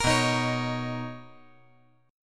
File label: B Major 9th B, chord